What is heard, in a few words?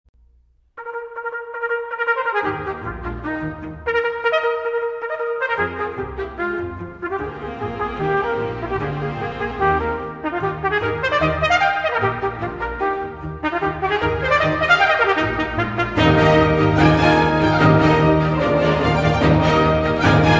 Brass Timbre test